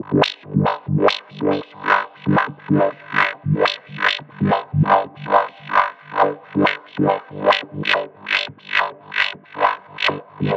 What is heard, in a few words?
Lead Line G 140 BPM, Psytrance; Lead Lines; Moduloracle